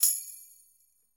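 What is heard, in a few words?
Tamborim Hit; Latin Percussion; Drums; Drum Hits; Tambourin; Ableton; Factory Packs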